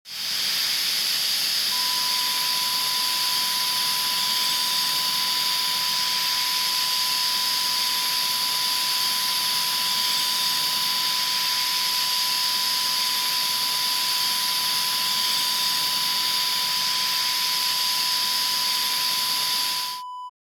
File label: steam leak